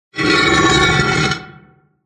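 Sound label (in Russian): канализационный люк отодвигают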